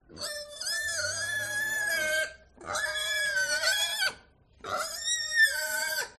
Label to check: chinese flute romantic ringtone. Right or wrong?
Wrong